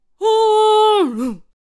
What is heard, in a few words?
Paladin Attack Vocals; Righteous Paladin